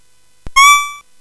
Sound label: Space Gun